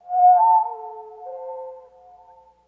bird echo; environment; Tribes2

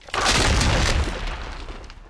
icefall, environment; Tribes2

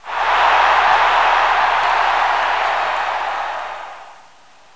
Cheer

